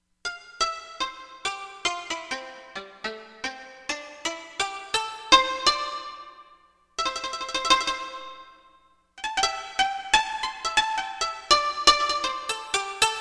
Koto, Korg MSC-13S Ethnic 2

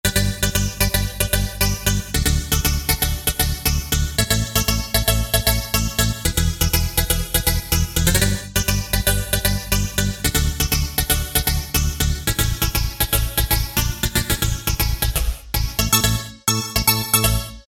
Hit; Roland JD-990; Vintage Board examples; Zone Rouge Hit

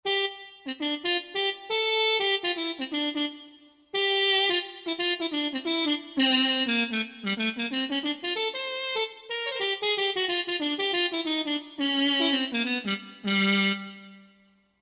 Harmon Mute, Roland JD-990; Factory Patches

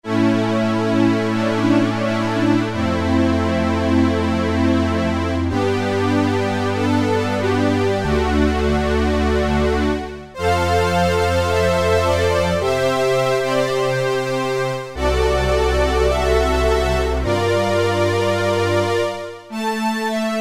Strings 2, Roland Juno-60; Factory presets

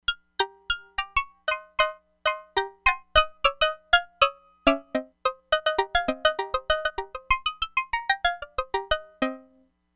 DARK WOOD TONE, Crumar Bit 99; Factory Programs

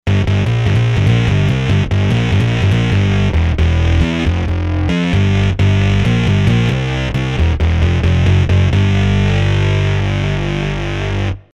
Bass Mean Bass

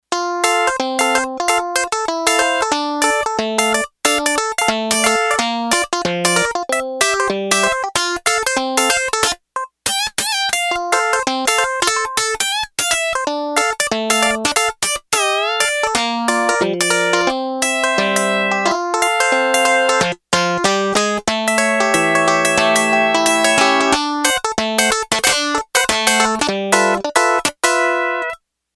Finga Picka, Yamaha TX802; Factory Patches; Voices

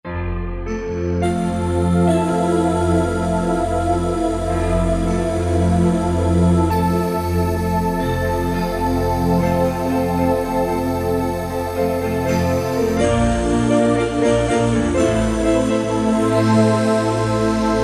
Trance, E-mu Morpheus; Factory Presets

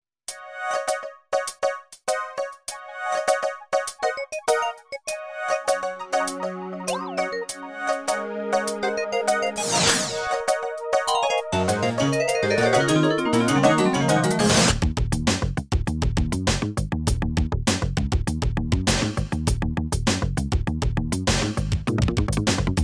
JamBox

